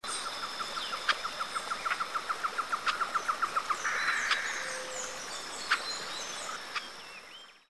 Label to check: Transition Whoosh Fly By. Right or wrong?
Wrong